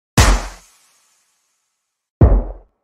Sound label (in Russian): zvuki khedshot